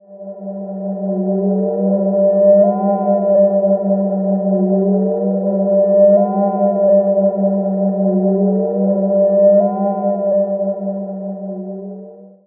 Desert Call G, Ambient; Zero-G Seismic Frequencies; 90 s Sample CDs

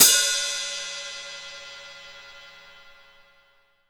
K-ZIL HHO, 14INCH K-ZIL HI HAT

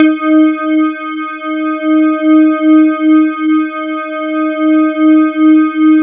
E-HARP, DX HARP; Wizoo - Powered DX

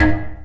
Oneshot

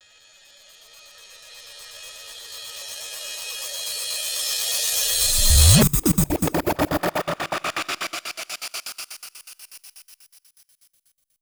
Reverse Space; Electronic Point Blank

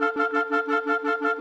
Clarinet Oboe, Orchestral; Sonic Foundry (Sony Creative Software); Orchestral VOL-2; Modern 09

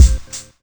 KICK, Hip Hop

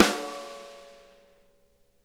RIM, snare; Sonic Reality; Interactive Drum Kits

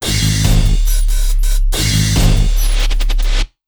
TECH 140, Big Beat Drumloops

